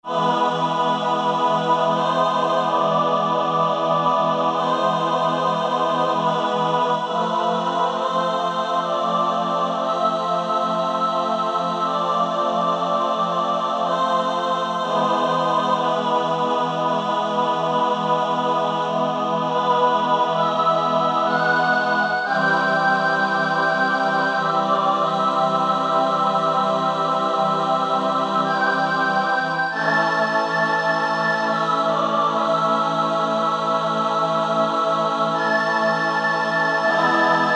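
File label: ensemble vox human; E-mu Proteus FX; Factory Presets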